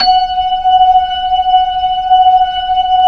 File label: B-3 F#6, Jazz Perc; Keyboards of The 60's and 70's